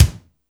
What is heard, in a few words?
kick, natural kick; Roland L-CD701; Natural K1